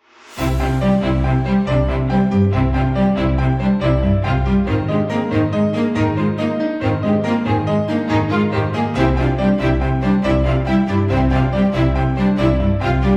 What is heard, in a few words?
uplifting journey